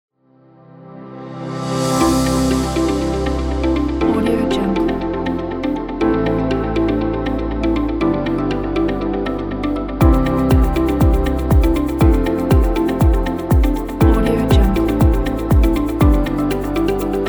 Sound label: motivation